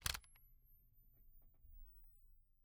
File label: Camera, Kodak Extramax, pocket, 110 film, plastic, metal, flash, switch on, power up; Eiravaein Works; Vaeyan IV